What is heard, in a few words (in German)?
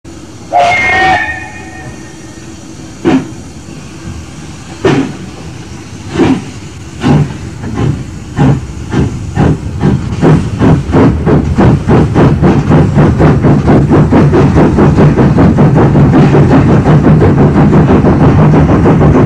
Anfahrt; Rellingh